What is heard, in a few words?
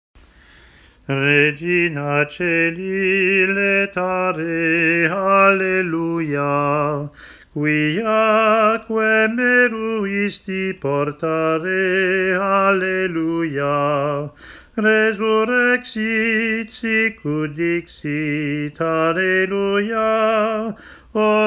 regina coeli simple tone; Regina Caeli – simple tone (Easter to Pentecost); Hymns